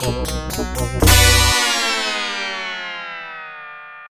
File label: Unknown Jingle; kid chameleon genesis